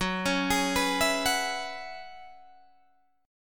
F sharp 7th Suspended 2nd Suspended 4th F sharp down Guitar Open D